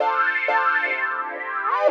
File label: house synth 125 A, keys